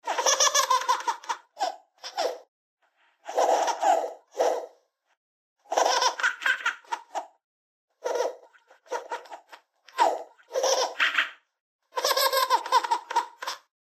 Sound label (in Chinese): 婴儿笑声小孩哈哈大笑